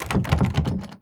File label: Door Lock; Devour